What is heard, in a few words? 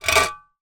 industrial shovel metal drag hard ground; zapsplat